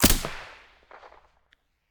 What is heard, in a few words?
vss silencer stereo, weapons